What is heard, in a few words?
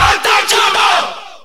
shout; falco